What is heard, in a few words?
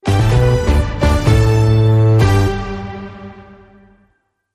News On Logo Only